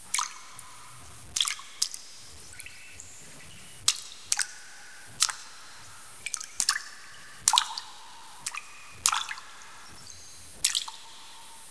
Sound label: tunnel drips, sewer